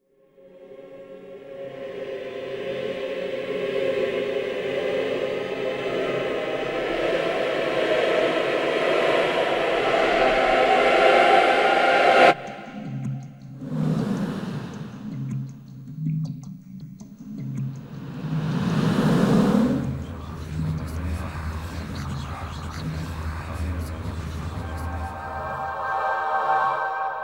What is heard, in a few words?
Choir FX; Spectrasonics Vocal Planet CD6; Groove Control; Symphony of Voices